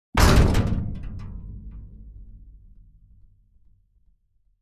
Designed aggressive metal impact with stretched string